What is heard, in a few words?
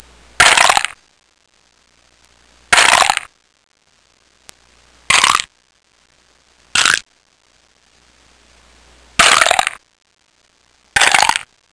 Various Eating Crunches; Soundsation; Human